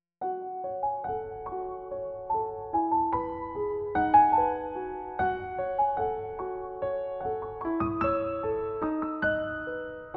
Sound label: Dark Lullaby